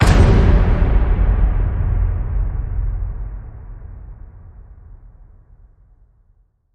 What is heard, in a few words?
Hit Big Barbaric